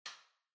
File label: Light Switch American Toggle Far Hall Mid